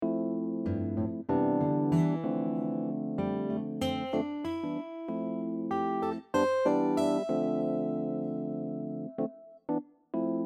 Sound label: Pentatonic example, guitar